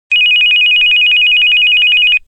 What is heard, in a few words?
Modern Bell; Samsung SCH-U310 Knack